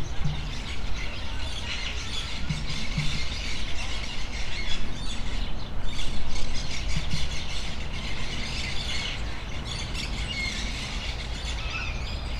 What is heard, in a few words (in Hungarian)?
dobolóhangot hallat, balra sziklapapagájok, emu; debreceni zoo 2012